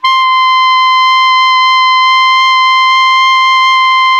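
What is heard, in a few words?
ALTO pianissimo C#5, Sax; Giga Samples Collection; ALTO SAX PP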